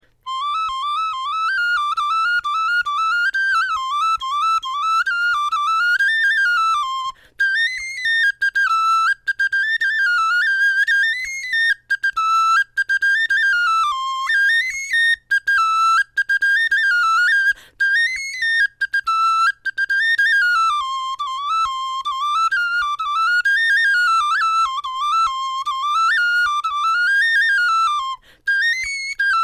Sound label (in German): melodie, knochenfloeten